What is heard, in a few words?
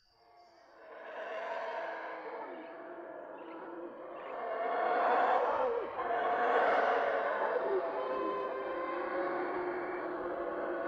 Howler Sounds